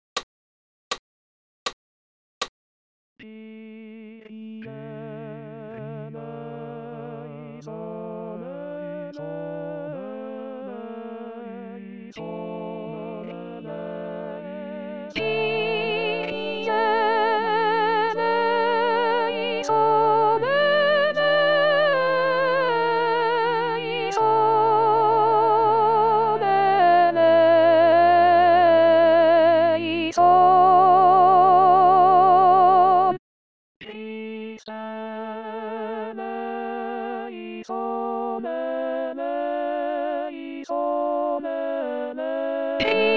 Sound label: Missa Brevis Kyrie Soprano; Antonio Lotti